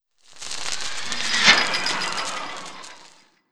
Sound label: Roaster Over Tail